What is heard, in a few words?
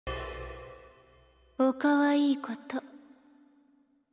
O kawaii koto